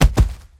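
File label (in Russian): zvuki padenii cheloveka, human falling sounds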